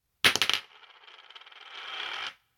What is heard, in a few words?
dice